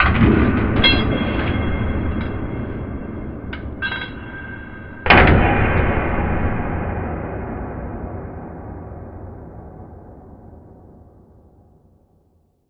big hit far

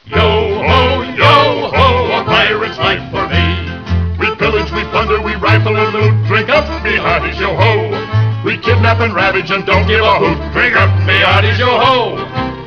yo ho

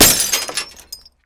glass break

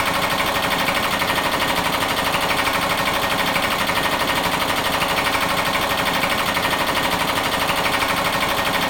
ambience generator

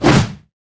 swipe

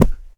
object fall impact

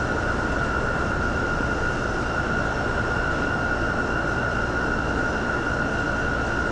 ambience

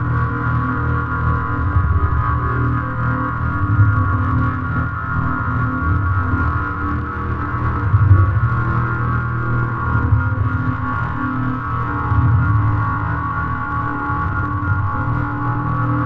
ambience

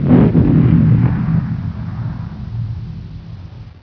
battle

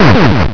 boom

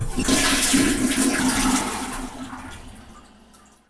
flush

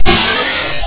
cartoon rise

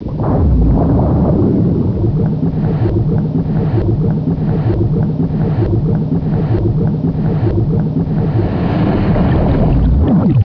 drain

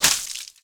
gore splat

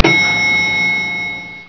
elevator ding

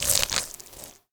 chomp

